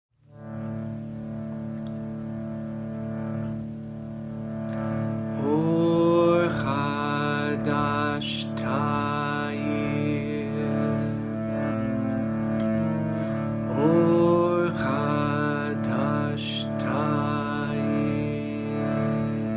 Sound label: chant; or hadash